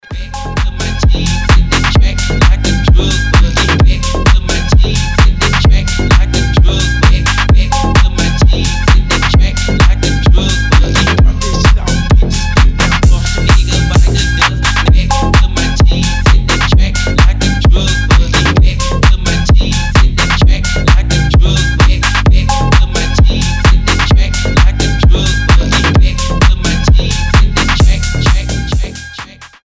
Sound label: secret phonk